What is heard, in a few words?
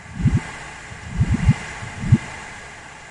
St Marks sounds